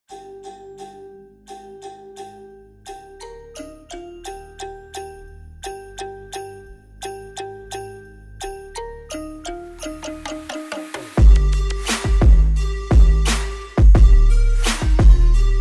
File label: jingle bells trap remix bass boosted